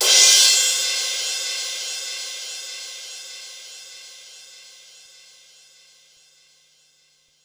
Cym Crash, Korg 05R-W Korg Cymbals; 300 Drum Machines

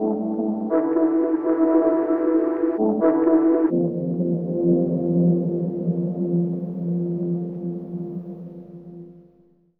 Tremellow, Guitars; Zero-G Smoke

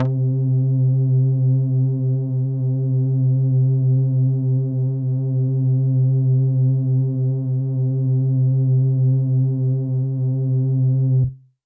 Pad C, Synth Prophet One Shots; Electronisounds

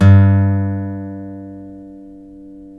STEEL G 2, FOLK STEEL Guitars; Spectrasonics; Hans Zimmer Guitars VOL-1

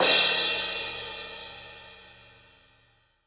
Gretsch Cymbal Crash; 1967 Gretsch Kit; One-Shots; Sonic Foundry (Sony Creative Software); Vital Drums

